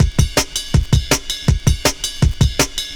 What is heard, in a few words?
breaks 162